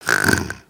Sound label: snore in